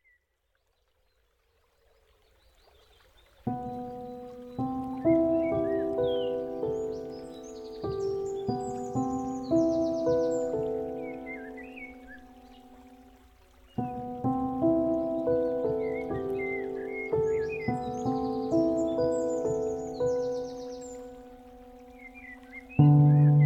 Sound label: birds and waterfalls